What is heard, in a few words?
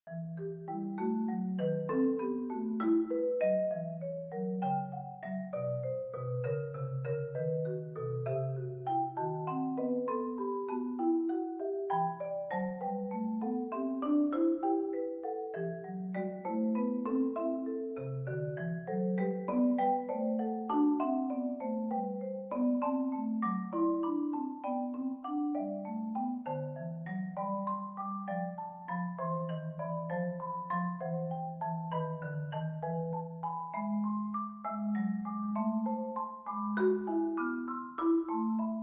A Minor Invention